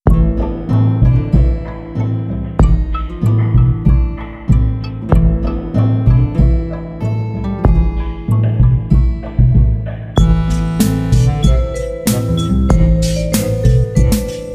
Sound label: Spooky Castle; Inspector Gunner; Yikes!!!